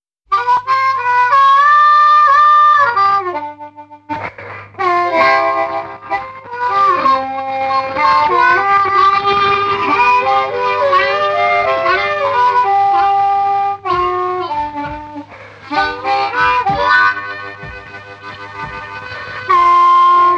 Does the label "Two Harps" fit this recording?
Yes